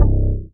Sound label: DM BAS, Dance Mega Synth; Best Service